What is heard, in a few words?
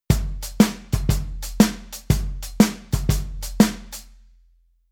Rhythm Shuffle